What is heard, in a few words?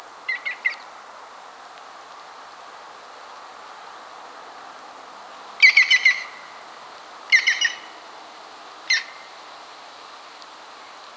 European Green Woodpecker Picus viridis